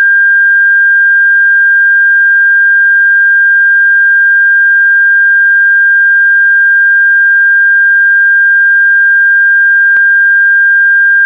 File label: tone 1600 3dB